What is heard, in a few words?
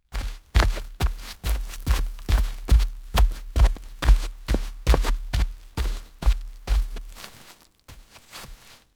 single boot walking on; short decay